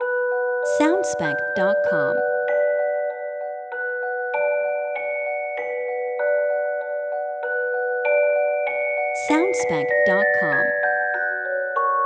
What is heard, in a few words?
Music Box Nostalgia Variation